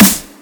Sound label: soft hit clap